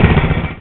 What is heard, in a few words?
Tank End